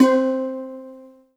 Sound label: Yamaha TG100 Stl Drum C4